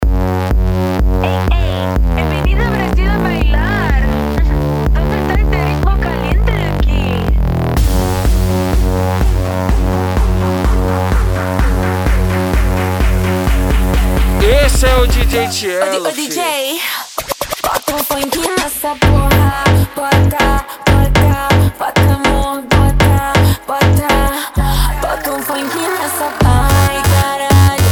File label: funk remix